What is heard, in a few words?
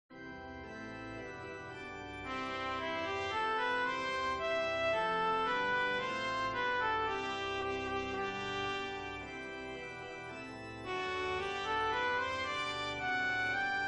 O Day Full of Grace Trumpet descant